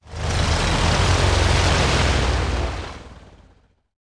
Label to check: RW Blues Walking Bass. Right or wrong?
Wrong